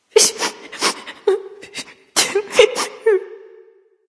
boohoo; cupid

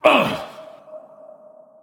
grunt hit; cernunnos

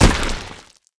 pillar hit; smite; god ganesha skin11

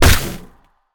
hit; smite; olorun

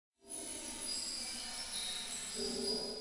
nightmare glide chimes; Smite; Thanatos

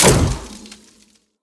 fire; smite; medusa

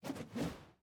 staff spin; Smite; Baron Samedi

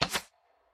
minion bow shoot; smite; npc; roman archer

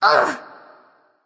grunt hit; bastet

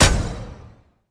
auto hit; smite; Apollo

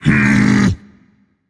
tongue rage; chaac

